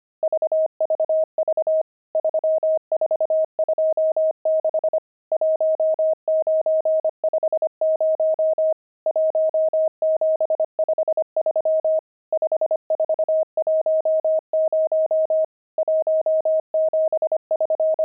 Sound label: pangram, intermediate pangrams files